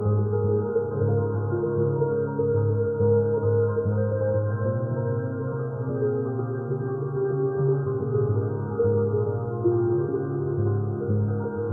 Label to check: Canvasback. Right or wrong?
Wrong